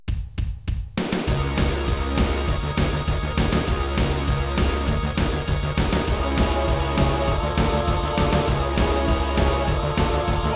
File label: uh oh time to go